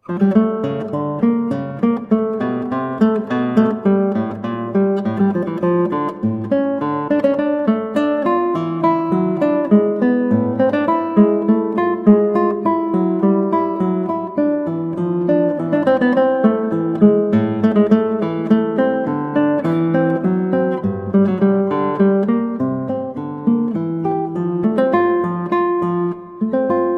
Vivace from Fantasia 2 for Flute, Guitar; TWV 40 3; Telemann; Georg Philip Telemann

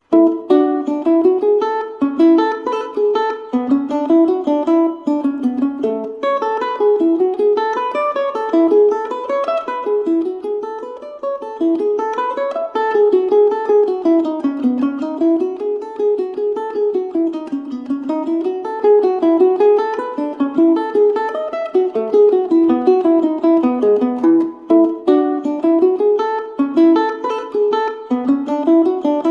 Bourree, Solo Mandola; JS Bach; from Partita no3 BWV1006; Johann Sebastian Bach

